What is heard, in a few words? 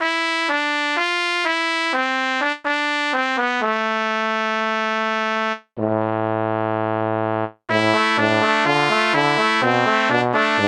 trumpet